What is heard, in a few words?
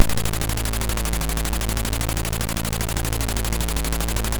Colony, MOS6581